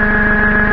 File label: little machine, ambience